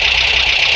jeep idle; 1997 MMX LAN PACK (win 95); Army Men

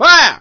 Fire; Army Men; TanUnits

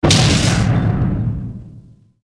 HIT LARGE; 1997 MMX LAN PACK (win 95)

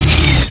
tank; 1997 MMX LAN PACK (win 95)

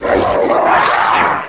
ATTACK; QUAKE 1; SHALRATH

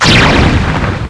heavylaser; 1997 MMX LAN PACK (win 95); Army Men

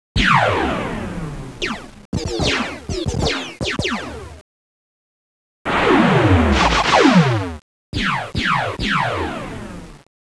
Laser Tunnel; 1997 MMX LAN PACK (win 95); Army Men

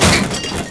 jeep collide; 1997 MMX LAN PACK (win 95); Army Men

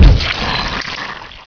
DEATH; Quake 1; BLOB

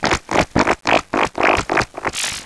Alien Laugh; 1997 MMX LAN PACK (win 95); Army Men

